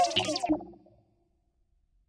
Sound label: virus glitch, Virus Electro Hits